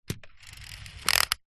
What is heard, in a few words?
leisure toy car pullback motor pull back click